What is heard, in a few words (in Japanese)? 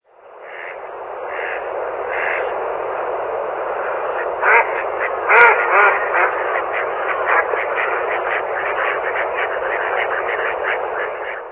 karugamo